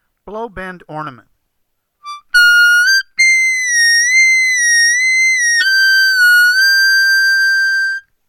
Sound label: Blow Bend Ornament